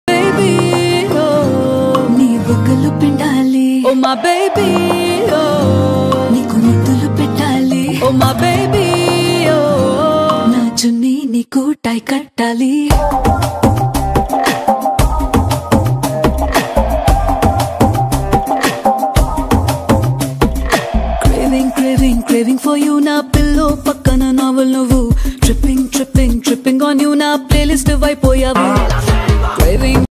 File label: telugu ringtone